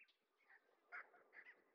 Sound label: monkeys; ambient soundscapes; dota